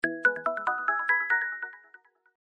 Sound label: Message Orange